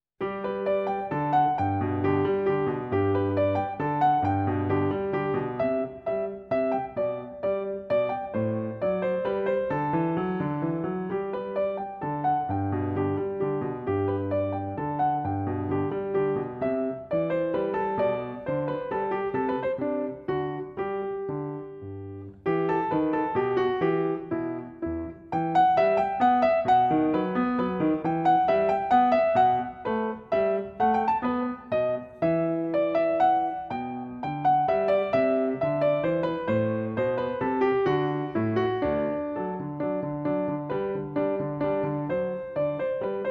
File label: Minuet in G Major; BWV Anh 116; attr Johann Sebastian Bach; Celebrate Theory recordings